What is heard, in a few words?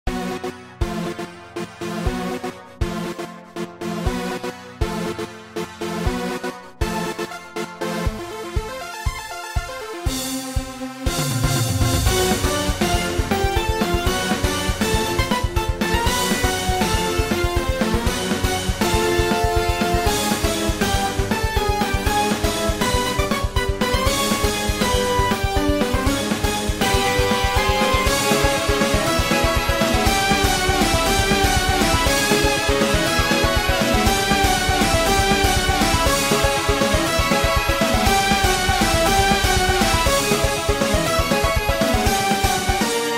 Trance